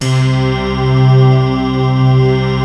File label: JV1000 SLOW; Rubber Chicken Software Sample Bank